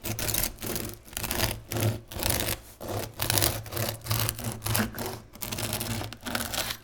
Fresh Bread Manual Slicing

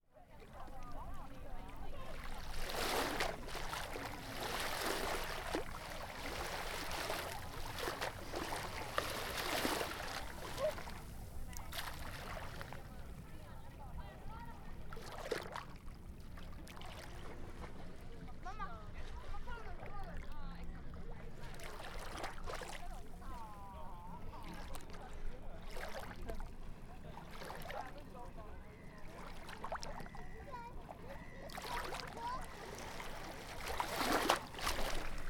An Evening By The Ocean

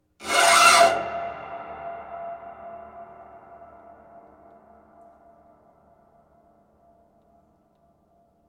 Scrapes

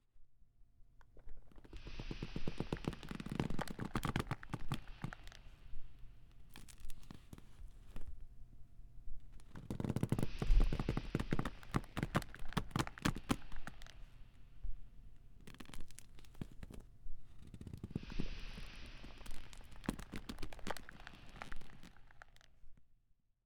Rubber arm

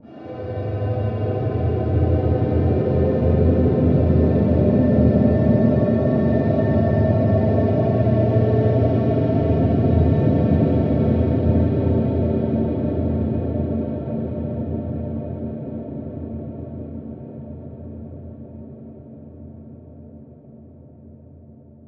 Dronescape

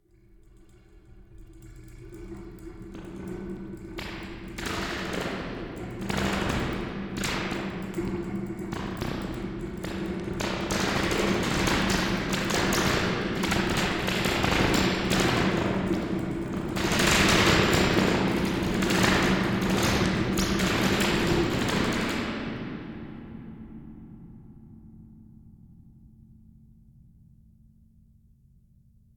Wobbling The Subframe

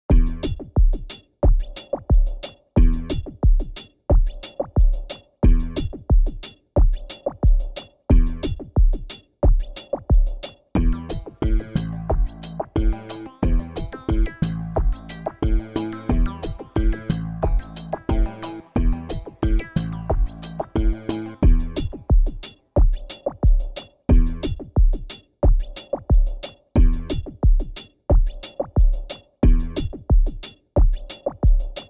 Slippery Loop

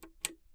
Button On

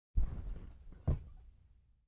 Sitting On A Sofa

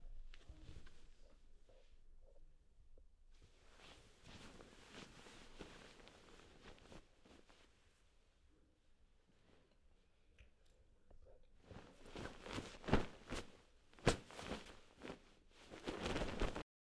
Bed Sheet Sounds

